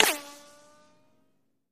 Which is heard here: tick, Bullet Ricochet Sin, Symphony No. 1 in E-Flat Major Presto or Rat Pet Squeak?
Bullet Ricochet Sin